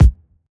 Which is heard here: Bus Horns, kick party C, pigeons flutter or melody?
kick party C